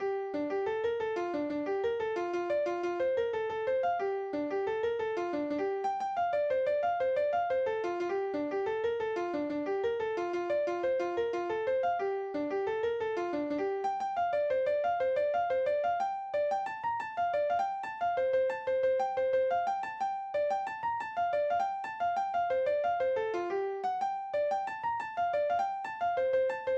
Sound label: jig; david s jig